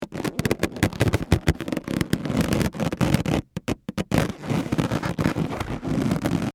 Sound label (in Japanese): gishigishi